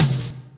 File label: tom; virtual drum machine